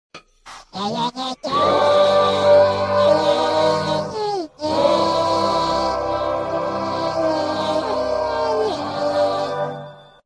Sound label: sing; gnarpy